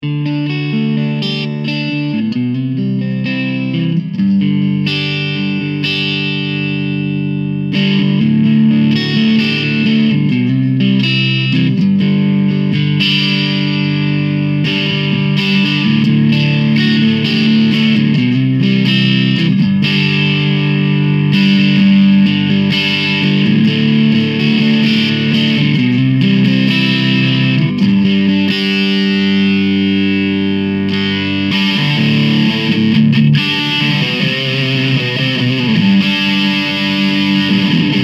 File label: smash drive